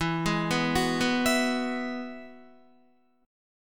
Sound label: E Major Add 9th, Guitar, down; arpeggiated; JGuitar